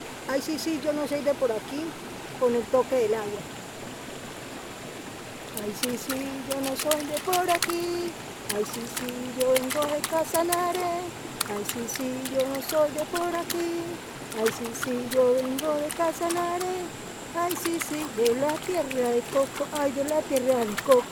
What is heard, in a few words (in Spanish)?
Cantando Hay si si